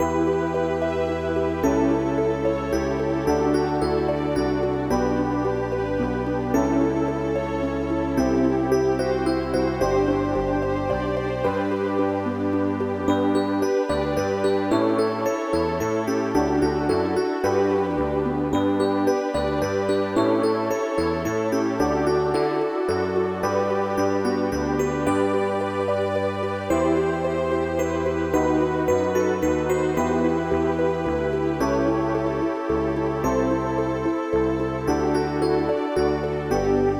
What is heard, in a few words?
Sensual; Kakyuusei; Saturn